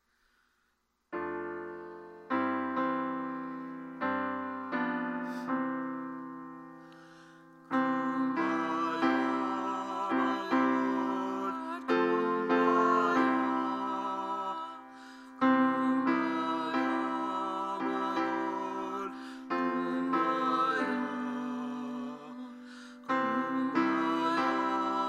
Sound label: Hymn